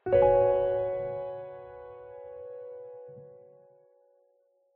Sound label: Level Ending Pre Roll